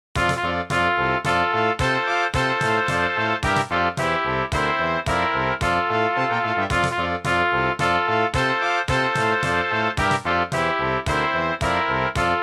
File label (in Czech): Fanfary